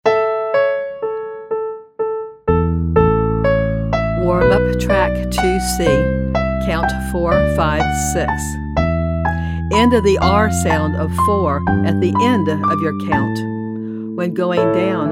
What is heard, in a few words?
Vocal Warm Up Count 456